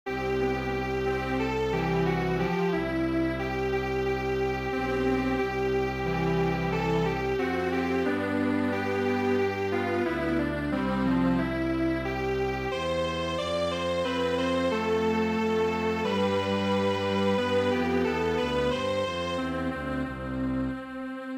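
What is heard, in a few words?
unplugged Strings Quartet; midi; traditional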